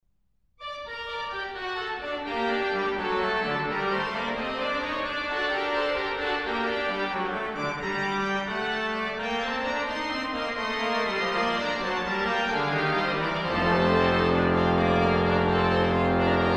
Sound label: Grand Choeur, Bayeux Cathedral; Boely; Holiday 2001 in France